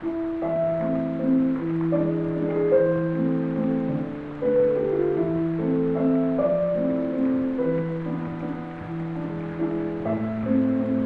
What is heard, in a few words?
prom waltz; know by heart